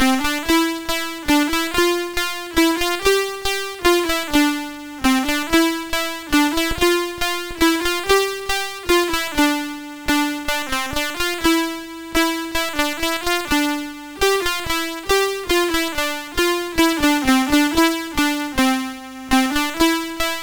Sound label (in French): Melodie, MOS6581R2